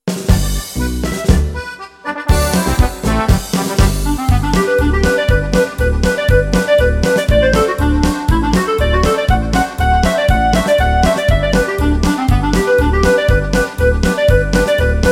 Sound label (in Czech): Klarinet polka